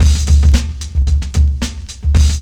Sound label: LOOP, DRUM; E-Lab; X Static Goldmine 2; akai